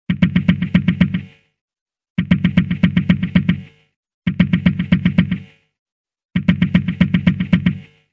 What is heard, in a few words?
115 boomtastic highs, drum loops